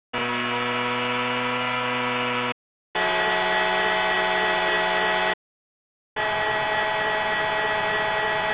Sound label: car loop; sfx